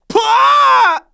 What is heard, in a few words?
knock; TrueCrimeStreetsOfLA; XBOX